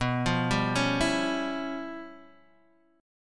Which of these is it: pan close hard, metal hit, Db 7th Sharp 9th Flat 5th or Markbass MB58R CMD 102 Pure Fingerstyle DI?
Db 7th Sharp 9th Flat 5th